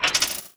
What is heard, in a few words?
Menu Click